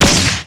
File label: laser; ufoai